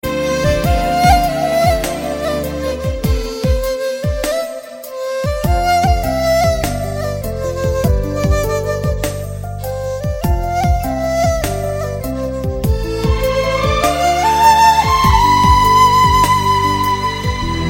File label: Melodic Flute